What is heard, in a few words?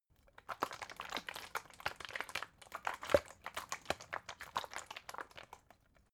bottle water big handling; gastronomie; berufe